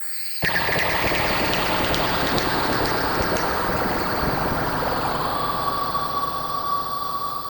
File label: Long Decay, FX; Sonic Foundry (Sony Creative Software); Underground UK House & Electro